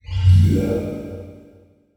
Multimedia Internet CD-Rom Flash Blurp

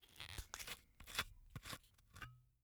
Bottle Cap Unscrewing On Glass Bottle; Foley

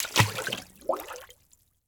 Liquid Water Water Footstep Single Step Into Pool

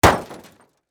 Impact Metal Smash Short

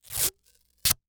Industry Tape Pull Off Roll And Rip Masking

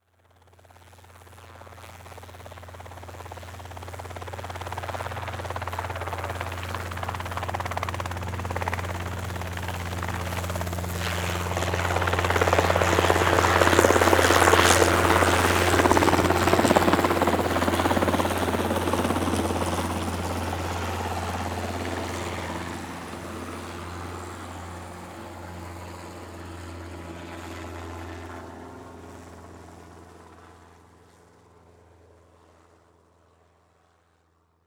Transportation Helicopter Fly By Overhead Slowly

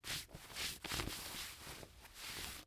Hand Rubbing Pants Slow; Foley

